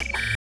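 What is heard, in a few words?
ALARM BP, alarm beep; Total Control - Action Cutz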